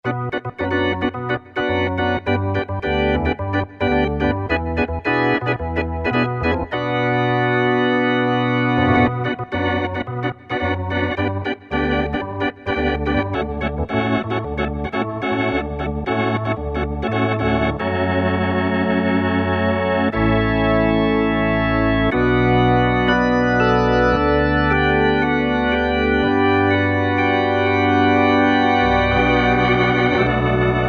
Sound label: Perc Organ